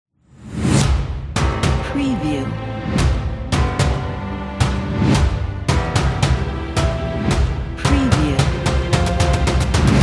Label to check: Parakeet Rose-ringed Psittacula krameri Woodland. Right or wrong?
Wrong